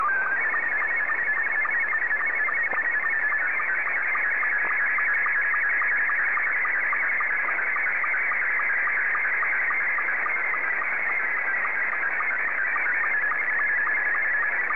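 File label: Scottie 2, slow-scan television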